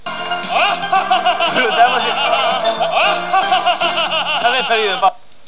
Laugh, Laughing sound effect - "Ohhhh, ho ho ho ho ho!!!"